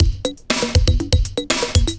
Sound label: 120 SOUL, DRUMS; Best Service Advanced Media Trax Modern Composer